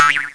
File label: TWINGY; special effects